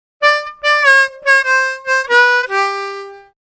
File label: Lick